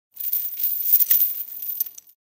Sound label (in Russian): zvon tsepi